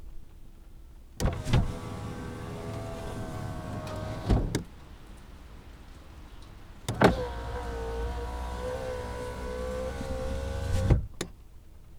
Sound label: Opening and closing car window